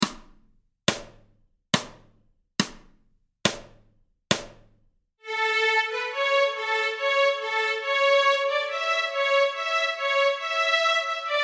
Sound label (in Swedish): Stråk A, Nyckelharpa; Friarvisa; A-strängen Steg 1 Två toner i dur